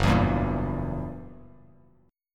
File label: Gb 7th Flat 9th Gb, Bass Standard; strummed; JGuitar